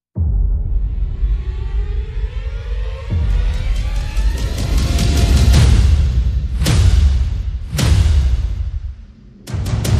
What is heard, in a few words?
Waltz, Perc and FX